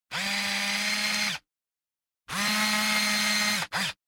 Zum

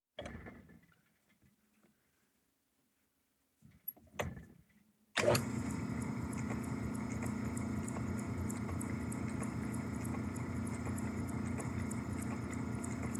Tokarnyi stanok

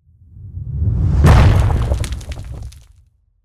Razbivaet stenu snaryad katapulty

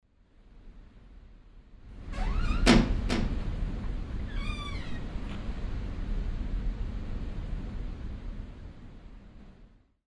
Poryv vetra

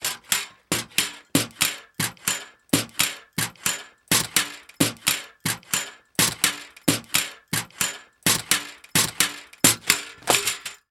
Pogo stik trenazher kuznechik

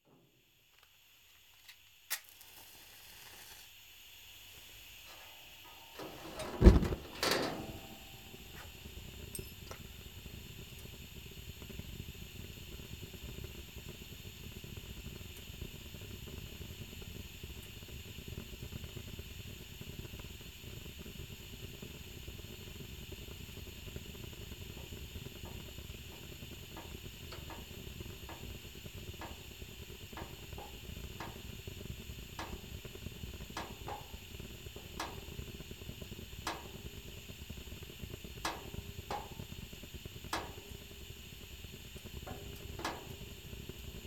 Gazovaya plitka

